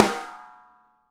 DRY SN, STUDIO DRY SNARE

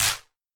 Wider Clap

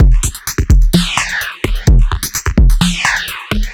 Machine Drums, 128bpm, analogue circuit; musicradar

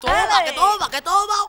VOC, Gipsy; Best Service; Ethnic Journey Sound Cube

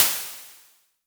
Crashes; LIMAT Div Dept; Drums

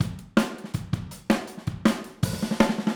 Fill Overhead, Drums, 162 BPM; Drums from the Big Room The Mixes; Take Your Toms Off

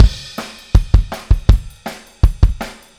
Kick, Drums, 162 BPM; Take Your Toms Off

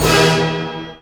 HIT ORCH.; Roland - Brass, Strings, Hits and Combos; Orch.Hits 1

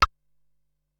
Toy Hammer, Ensoniq SQ-R-Plus

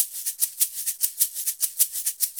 Shaker 100 bpm; Drums & Percussion; Best Service; Festa Latina VOL-1

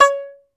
T. BANJ C#1, Tenor Banjo; E-MU Producer Series Vol. 7 – Old World Instruments

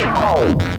Kaos FX, Ambient Grooves

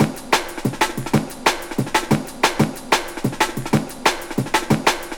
COOL BREAK raw; M8; percussion and foley

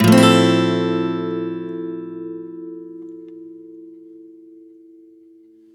F maj7 downstroke, Acoustic Guitar; Sonic Foundry (Sony Creative Software); Songwriter Acoustic Guitar Companion; One Shot Chords

